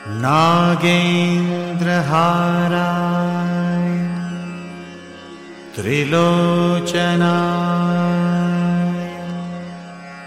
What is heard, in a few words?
om namah shivay